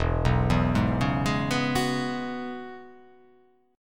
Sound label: E 6th Add 9th E down